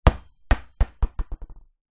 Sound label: sport soccer ball drop on concrete ground bounce to stop